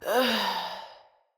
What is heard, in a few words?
Death Female; Battle Barks; Envoy